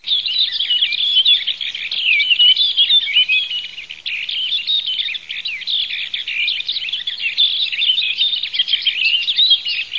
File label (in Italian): CAPINERA BECCAFICO